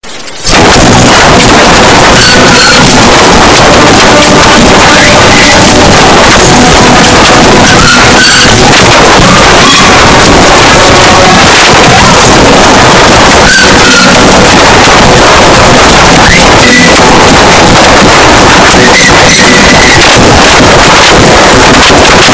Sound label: despicable, whistle